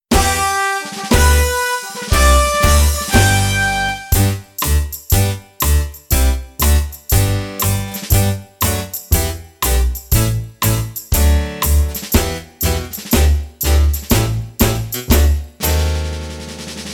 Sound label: Instrumental